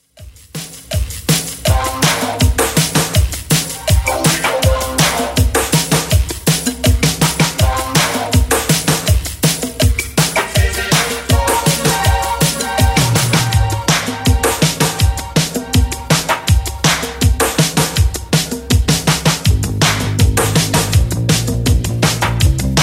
80s Club Remix 162 bpm

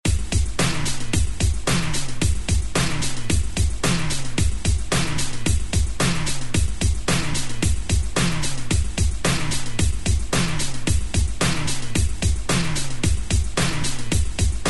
ReDrum 111 Bpm; DJDOLLS

